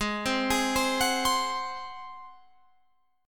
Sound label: Ab 7th Ab down Guitar Open F; arpeggiated